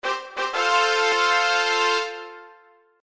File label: fanfare